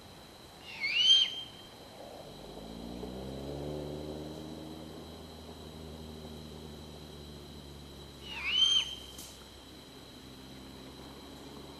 Bubo, eucal; Chajari; gotas

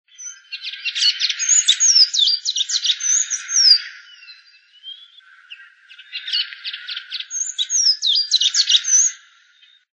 Celestino comun, Sayaca Tanager (Thraupis sayaca)

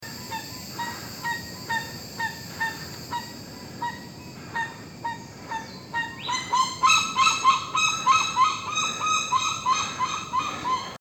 Pava Crestada

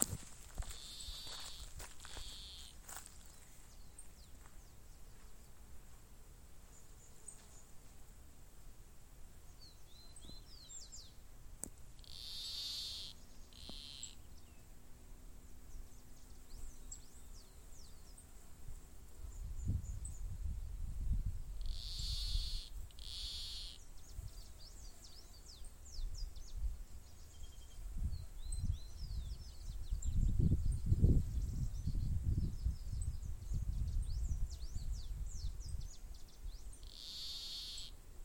Cortarramas; Phytotoma rutila

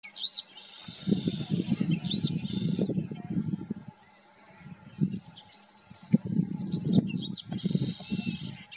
cachirla comun; Caminheiro-de-espora (Anthus correndera)

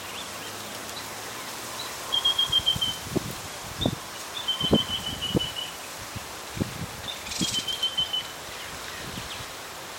Burrito pecho gris

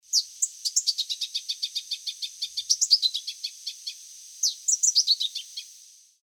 Aranero c negra, Pia-cobra (Geothlypis velata)

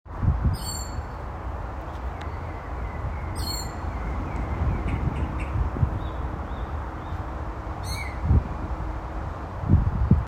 Zorzal plomizo

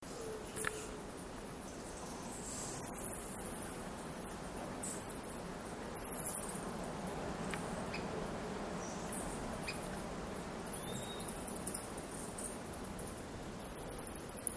vampiro

